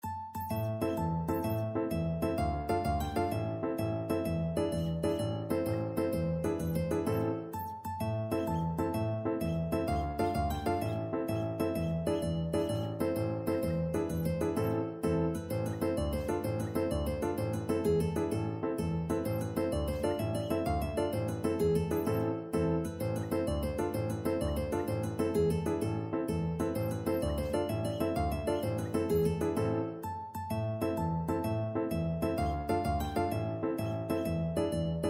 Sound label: tarantella, mandolin